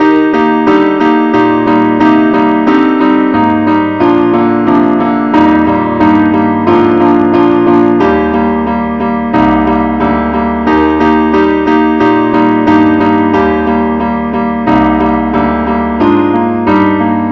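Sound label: keyboard only key E flat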